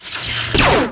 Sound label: attack; YOR TAN